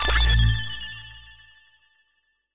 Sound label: space chip minor